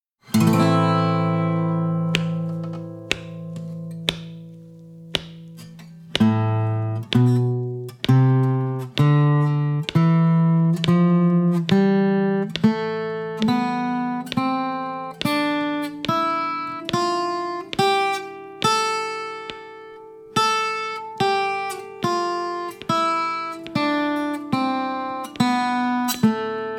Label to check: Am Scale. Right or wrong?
Right